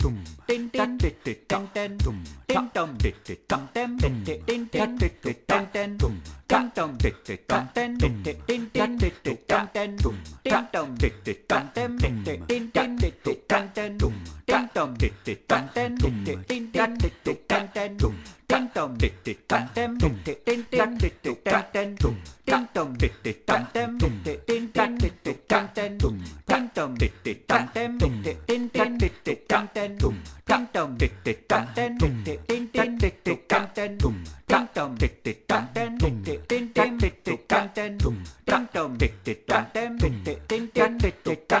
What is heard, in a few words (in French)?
Accompagnement pour travail solo djembe